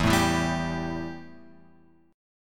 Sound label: E Minor Double Flat 5th E; Embb5; {0 0 2 0 X 3} strummed